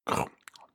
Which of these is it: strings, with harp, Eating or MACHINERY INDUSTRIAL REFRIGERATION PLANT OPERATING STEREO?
Eating